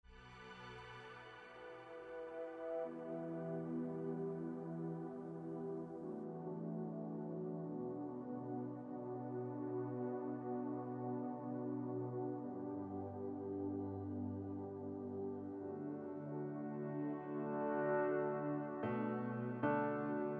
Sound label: instrumental